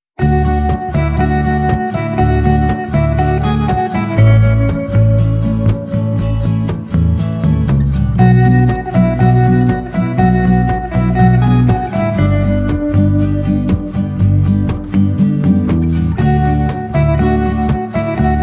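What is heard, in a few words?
Togetherness, Instrumental No Drums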